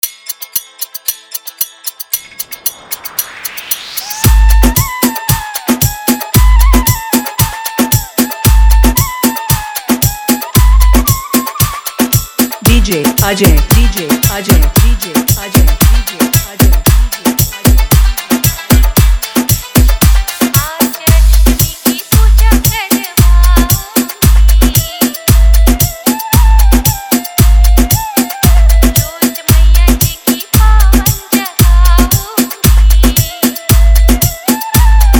Boo Bass EDM Jump Mix

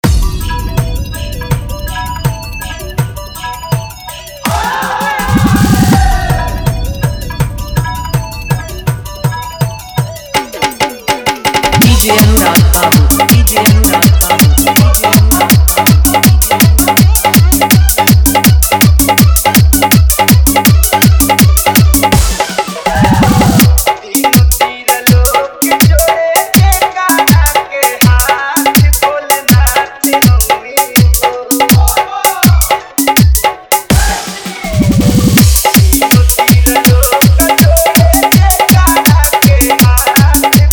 Bolbam Full Dhollki Bass Rod Dance Mix

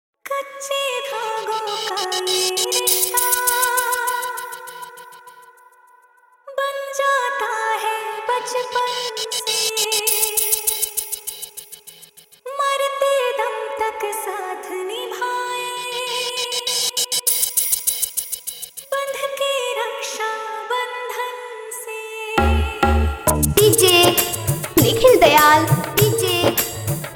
Raksha Bandhan Special Pad Mix Dj Song

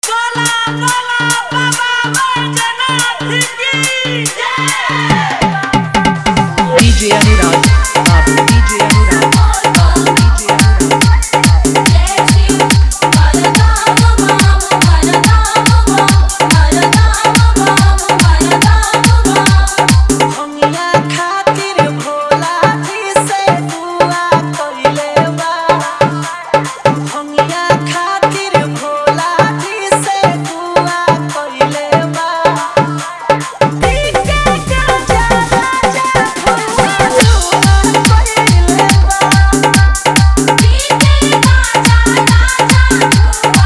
Bolbam Full Hard Bass Mix

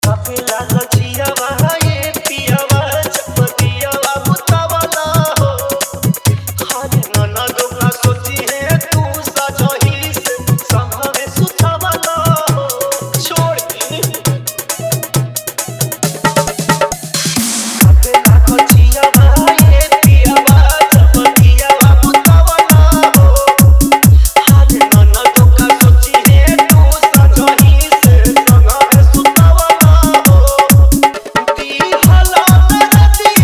New Viral Bhojpuri Dj Song Hard Dhollki Bass Mix

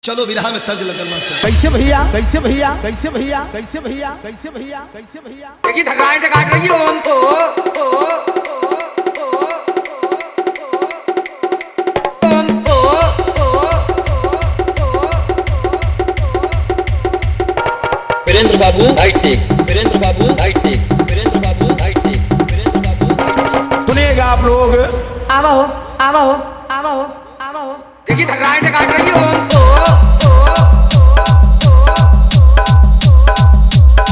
hard bass jhan jhan dj remix; virendra music

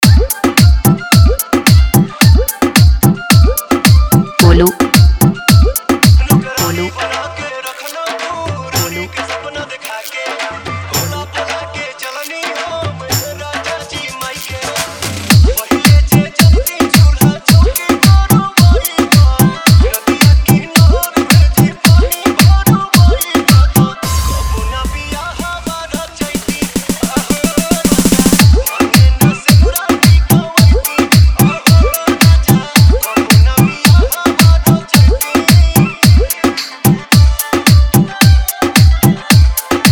Remix

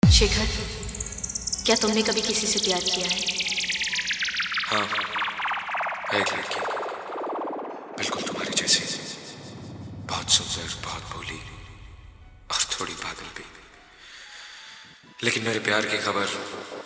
Old Hindi Weeding Hard Dhollki Bass Mix

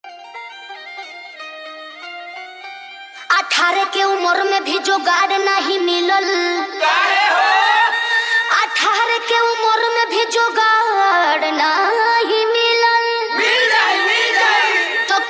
Holi Super Full Dhollki Hard Bass Dance Mix

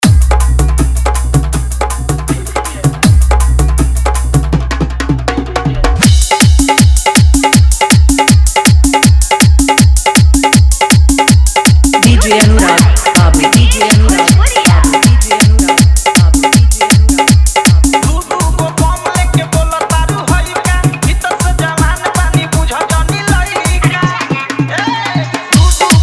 Fully Dhollki Bass Dance Mix

